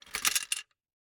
Gumball machine, metal, glass, candy, switch, mechanism, rotate, dropping, wide; Eiravaein Works; Vaeyan IV